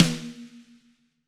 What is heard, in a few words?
Snare Crack; apulSoft apTrigga2; Steven Slate Drums